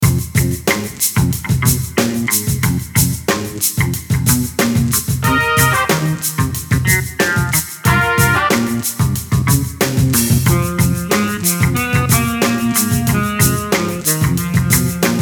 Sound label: Mellotron E-Guitar Saxophon 2 Brass Trombone; Mellotron Micro